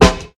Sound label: short acoustic snare sound f key, Royality free snare single hit tuned to the F note.; snare drum samples